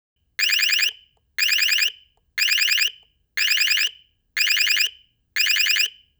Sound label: alarm; security; miseban